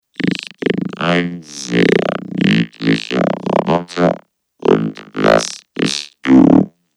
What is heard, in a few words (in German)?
niedlicher roboter